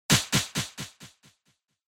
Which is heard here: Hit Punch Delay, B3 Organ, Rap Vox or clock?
Hit Punch Delay